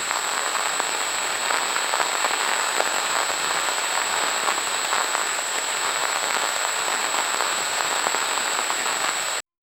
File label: the sound of cybernetic f